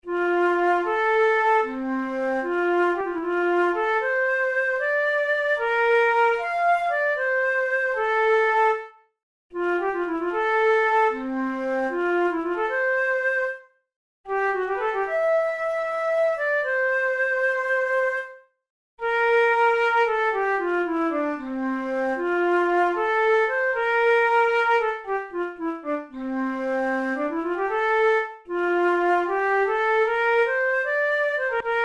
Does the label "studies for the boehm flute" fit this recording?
Yes